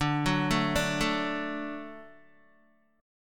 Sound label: D Major D down Guitar; arpeggiated; tuning 4E,4A,5D,5G,5C,6F